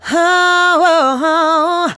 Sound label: Hoohoho, Vocal Phrases; Ultimate Vocals